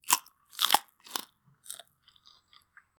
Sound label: crisp crunch of watermelo, bite